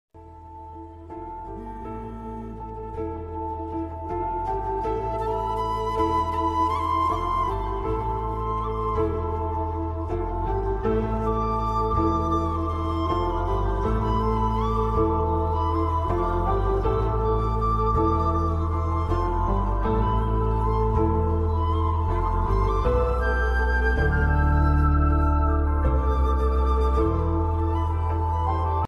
sad bgm ringtone viral ringtone trending ringtone sad; premalu movie